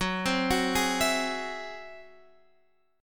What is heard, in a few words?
G sharp Augmented 7th